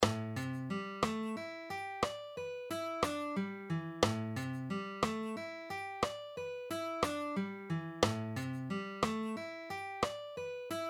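String Skipping Exercises Half Speed, A and E minor Pentatonics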